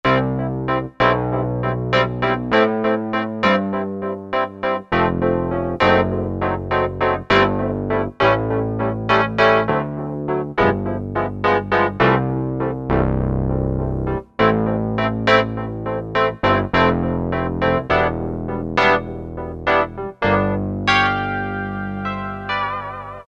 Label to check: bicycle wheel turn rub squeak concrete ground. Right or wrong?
Wrong